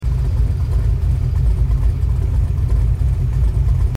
shelby idle, simulated vehicles

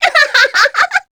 succubus laugh spin; queen girl

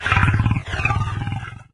idle, doom3 pinky

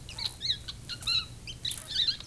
rats, dungeon; soundscapes

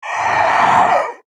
pain, zomb female; nmr

